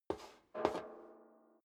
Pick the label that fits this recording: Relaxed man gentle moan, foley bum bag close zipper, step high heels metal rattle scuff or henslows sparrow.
step high heels metal rattle scuff